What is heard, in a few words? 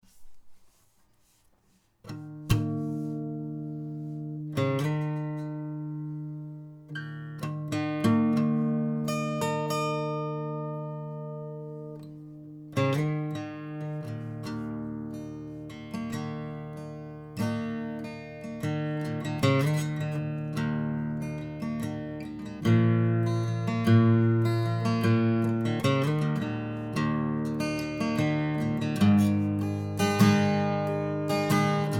drop d